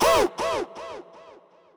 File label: "hoo"